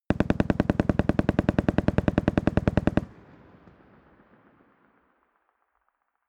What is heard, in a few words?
rifle ak-47 exterior fire automatic distant; schusswaffen